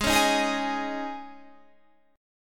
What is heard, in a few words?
G sharp Suspended 4th G sharp, Guitar Standard; G#sus4 strummed; JGuitar